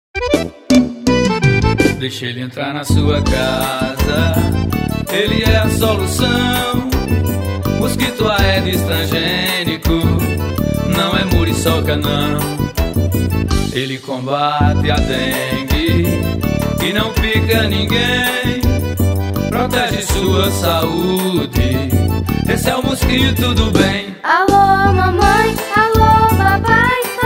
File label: jingle; DENGUE